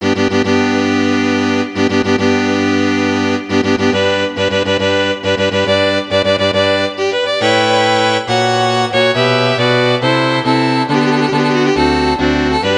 hochzeitsmarsch mendelssohn streichquartett partitur

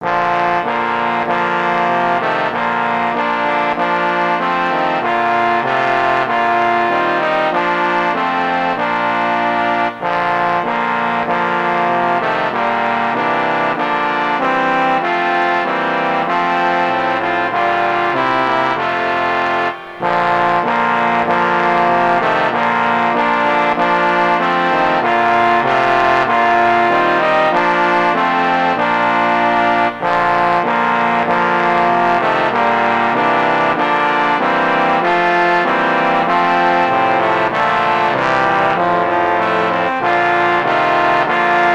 hoert ihr wie die glocken klingen posaunenquartett

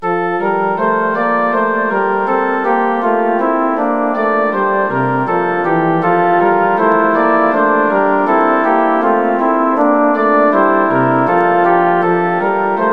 vom himmel hoch da komm ich her orgel

